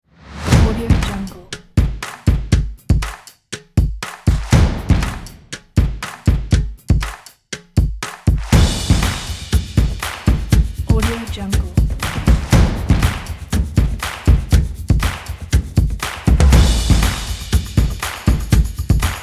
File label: the drums